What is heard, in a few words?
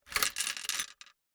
Gumball machine, metal, glass, candy, switch, mechanism, rotate, dropping, jostle; Eiravaein Works; Vaeyan IV